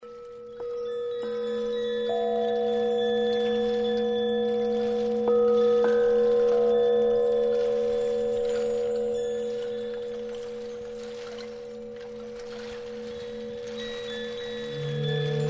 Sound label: Bells